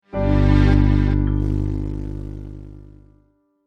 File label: Scifi Audio Logo Classic Appear And Glow